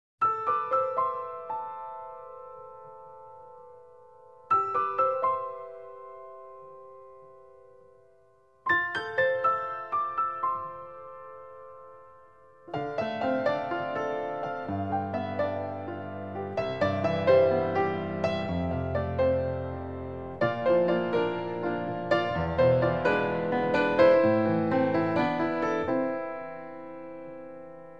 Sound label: sedaye powerpoint Rain Story